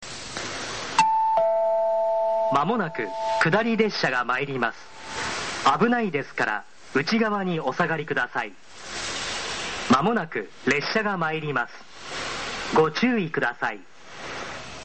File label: sekkin; gotemba